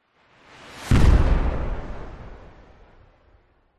sound design cinematic short fast whoosh into a dull punchy explosion; zapsplat